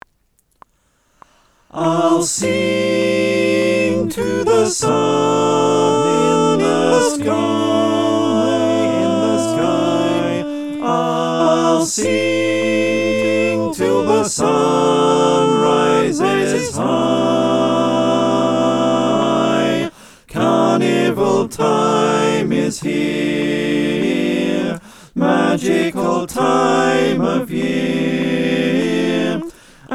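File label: soprano alto tenor bass, choir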